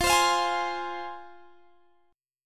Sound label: F Minor, F, Guitar, Standard; strummed; capo 2; x,20,22,22,21,20